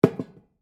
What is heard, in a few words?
Spittoon Set Down, Foley, Spittoon, Set, Down, Metal; Set Downs; Objects; BlastWave